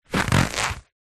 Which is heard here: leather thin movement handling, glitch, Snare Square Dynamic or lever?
leather thin movement handling